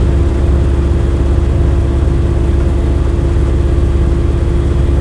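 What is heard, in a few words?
yak cockpit right, engines; War Thunder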